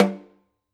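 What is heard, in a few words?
Snare Off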